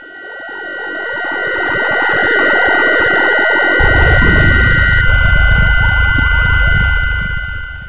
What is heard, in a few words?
teleport